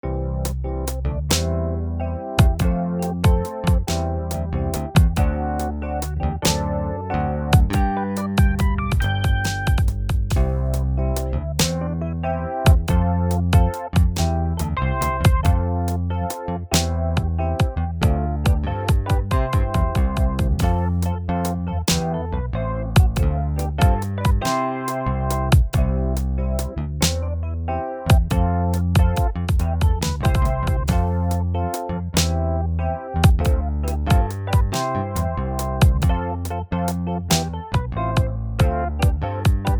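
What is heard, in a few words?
tracks no melody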